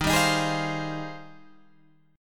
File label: Ab Major 13th Eb